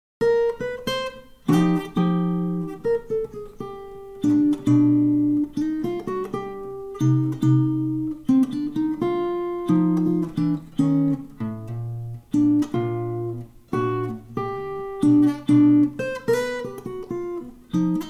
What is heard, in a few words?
blues